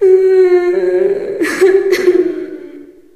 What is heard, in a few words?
female cry, witch voice idle; npc; source engine; left 4 dead 2